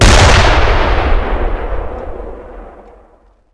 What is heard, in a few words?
mortar fire 80mm; WAR